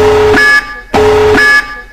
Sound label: Alert